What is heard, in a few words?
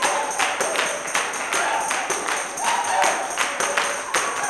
160 TAMB BRK; Blues & Soul